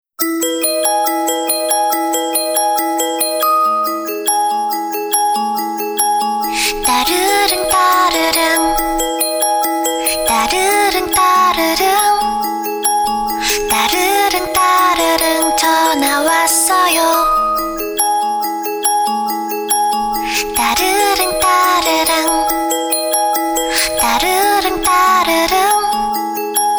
Ding Dong, ringtones; Optimus 2X SU660

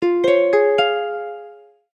Joyful, notifications; Huawei HarmonyOS 2.0

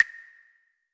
Biscay, notifications; Essential PH-1

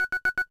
Zoom In; LG T500 Ego

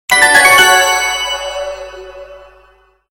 Everchanging message, ringtones; Sony Ericsson S500i

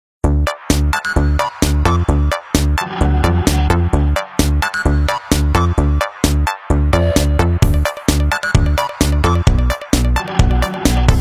Ringmod, Ringtones; Nokia; 7500 Prism

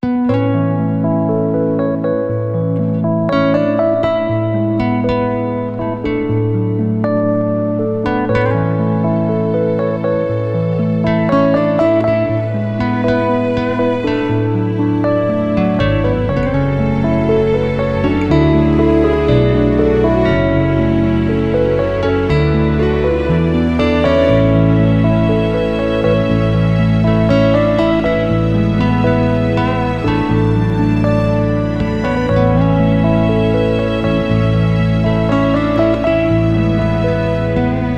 Alarm; Tyralicious AlarmB; Sony Ericsson; PlusFourSix; Tyra (G900)

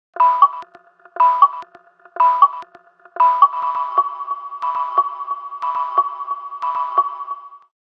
alarm; Nokia; 8800

